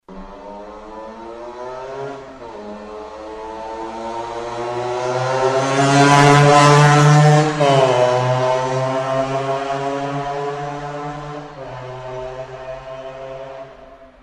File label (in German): Fenster offen